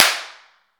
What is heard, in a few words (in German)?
wohnzimmer